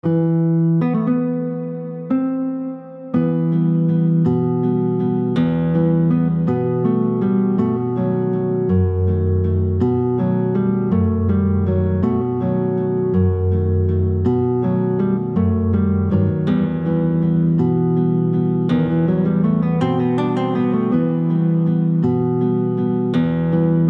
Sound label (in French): valse à deux guitares